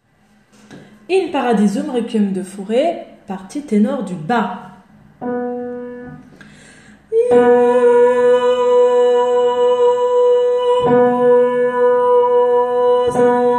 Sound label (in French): Ténor bas; In Paradisum